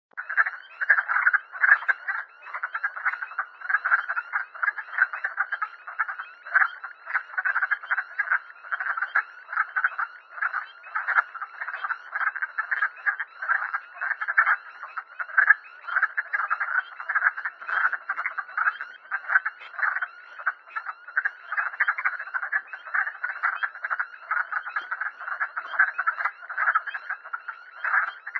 wood frog calling; citizen science

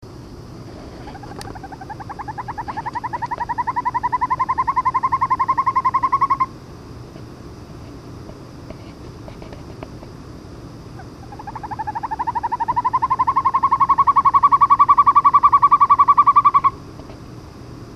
hawk owl; owls

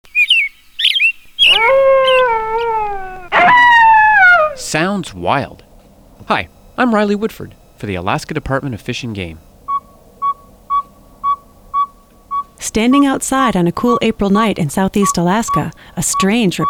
saw whet owls

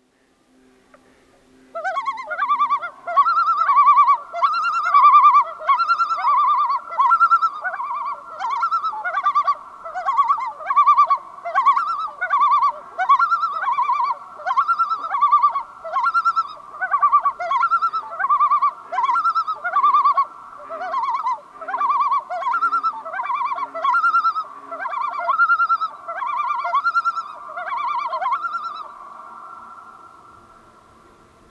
common loon; citizen science

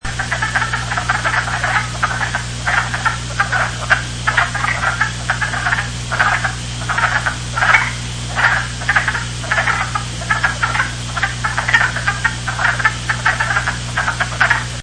wood frog calling; citizen science

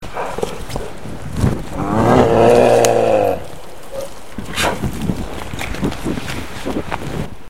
bear roar

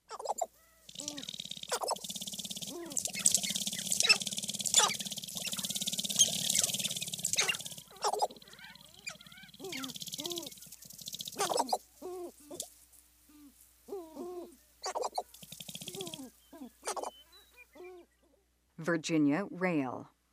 sharp tailed grouse

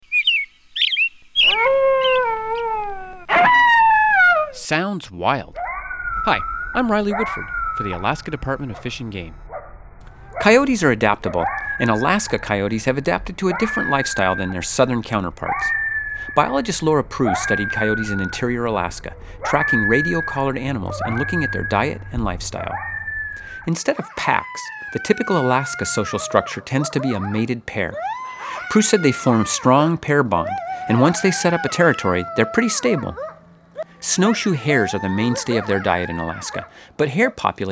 coyotes

